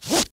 rope end, Handcuffs; civ13; civ14; Items

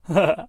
male laugh; Voices Emotes

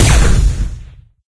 laser cannon, Gunshots; Weapons, Guns

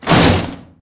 metal slam

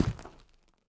asteroid, footsteps

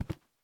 tile, Footsteps; civ13; civ14

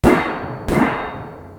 clang; civ13; civ14

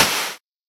energy metal, guns hits; Weapons

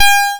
chime; Machines

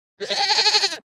goat bah; Animals

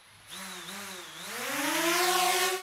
drone startup, vehicles engine

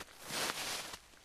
snow, footsteps

